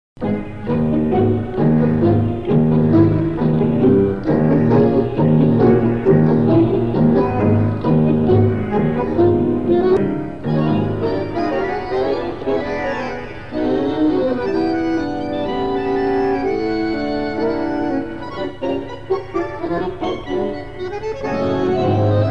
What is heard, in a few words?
Instrumental; uruguay; melodias